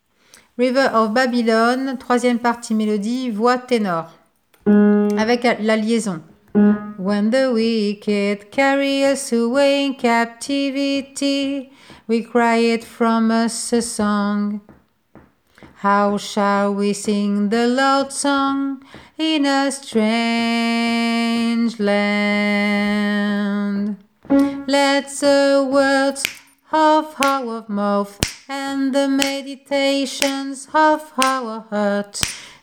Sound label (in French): tenor